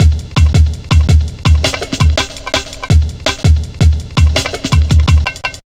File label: Drum Loops, 165.5, Jungle; Zero-G Jungle Warfare 1, 2 & 3